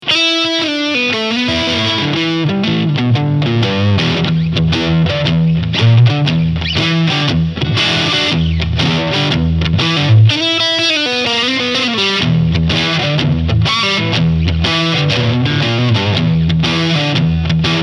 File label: Boss Katana 50 MKII; Preset Brown